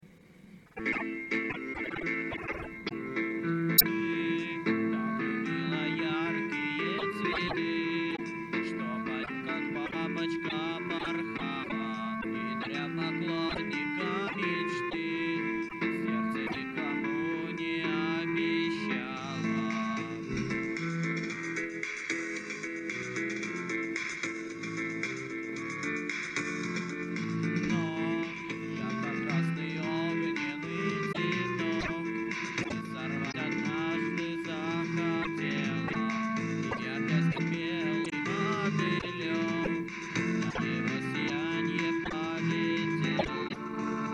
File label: samba